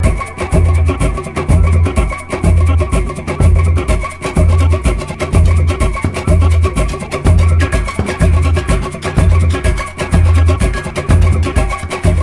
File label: Softee Samba